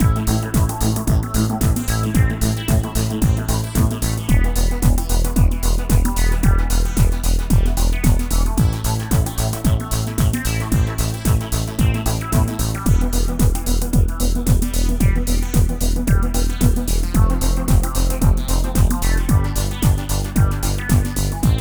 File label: Disco, funny quirky